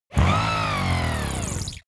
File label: servo rubber rev up